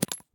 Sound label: grenade grass; STALKER; IWP